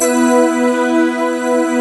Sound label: AMBI PAD